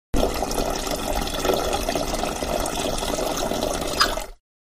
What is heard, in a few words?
pot